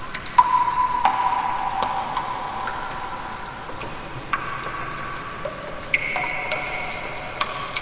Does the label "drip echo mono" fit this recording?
Yes